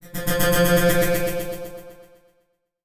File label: Title Transition Delay Short